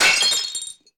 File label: glass break; firefight